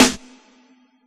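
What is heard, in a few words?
hip hop kit snare; Dr 202 Kit 1; Percussion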